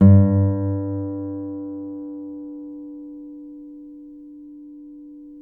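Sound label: nylon, FLAMENCO, Guitars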